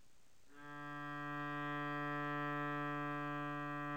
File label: cello D